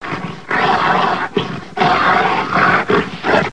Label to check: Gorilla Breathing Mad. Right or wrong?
Right